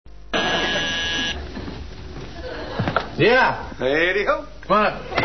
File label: buzzer; come on up; Seinfeld